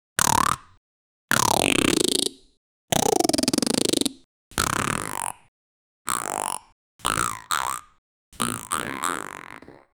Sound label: robot servohydraulic, factory